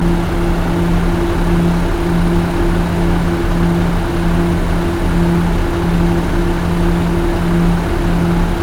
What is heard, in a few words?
bus engine